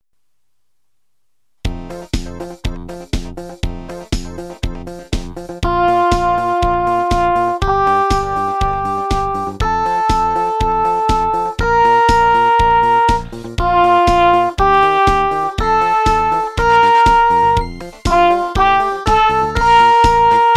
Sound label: Scale Up